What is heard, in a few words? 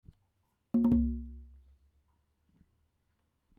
accompaniment; variation A